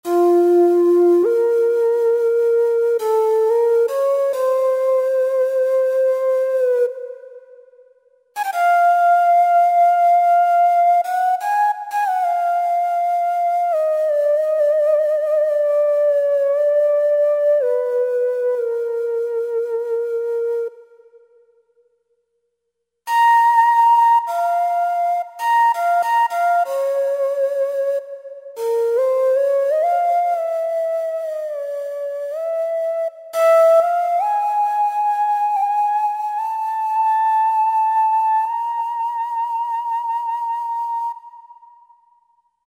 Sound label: Angle Flute, Roland V-Synth; Factory Patches